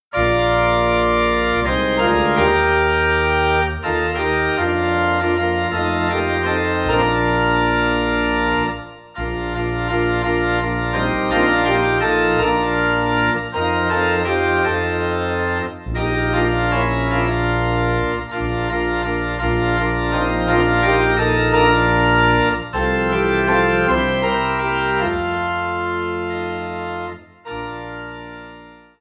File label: Organ in B Flat; Jesus Is Calling